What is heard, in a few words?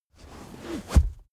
car armrest backseat close